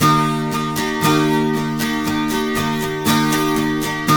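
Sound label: 12 string major E3, Guitars; Spectrasonics; Hans Zimmer Guitars VOL-1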